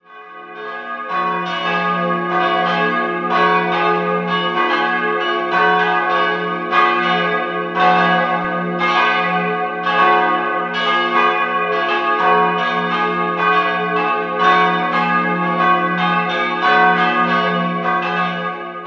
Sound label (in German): Alfershausen, Glocken